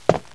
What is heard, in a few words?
footstep wood